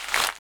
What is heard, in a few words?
paper dug; minetest; minetest mtg plus